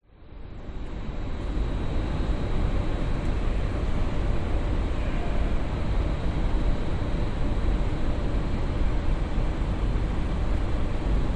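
public places uni recreation area exterior quite few people birds evening brisbane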